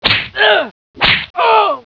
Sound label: whipped